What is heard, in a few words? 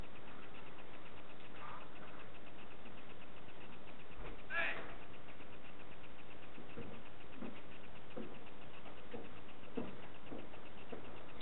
whisper and hey hey